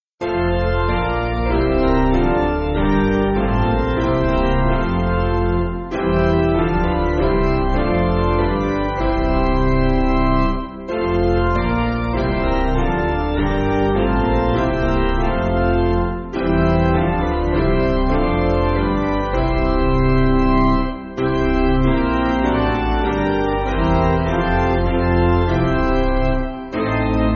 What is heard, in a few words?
Piano, Music Backing Files for Churches; Basic Piano & Organ (CM)